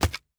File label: Build Interface